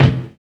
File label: DEATH KIK, HIP-HOP; Drums In Your FACE!